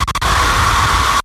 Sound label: aaaaaa; item asylum